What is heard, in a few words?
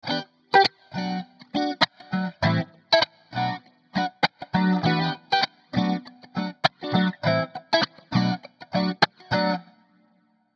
FX e minor 100bpm